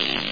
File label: fart; deliantra